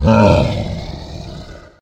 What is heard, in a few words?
golem awaken